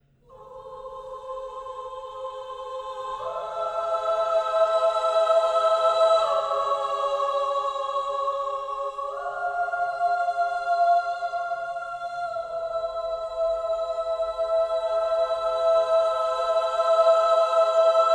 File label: Music Cutscene Summon Ship Outro; Fable Anniversary